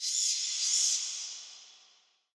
Under City Bat Chatter Distant

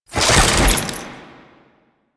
Ammo Menu Open; DE; Warframe; Warframes; Frumentarius; AmmoAbility

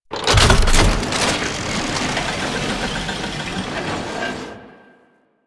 Alchemy Transmuter Start; Warframe; Entrati

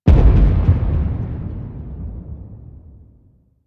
TNT Explosion LFE; DE; Warframe; Weapons; Archwing; Powers

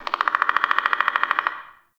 Forest Animal Mid; Ambience

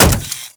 Riot Moa Step Default, Footsteps; Warframe

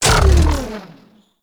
Mech Stop; Warframe; Vehicles; NechroMech

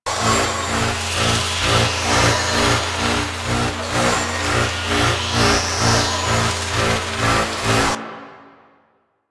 Crp Ship Spinning Reactor Loop; Ambience; Props; Warframe; Corpus Ship Remaster

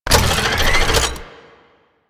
Long Gun Alt Fire Charge; Warframe; Thano Tech Arch Long Gun

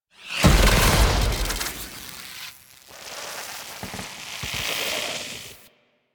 SFX Interference Part A; Warframe; The War Within

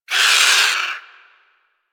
Avichaea Vocal Death; Warframe; Hunting Critters

